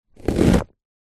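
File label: lemon or lime sounds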